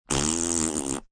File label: Fart Motorcycle, Mouth effects, fart, motorcycle (human voice); VoiceBox